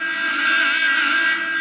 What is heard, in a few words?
AIR RAID; Distored Reality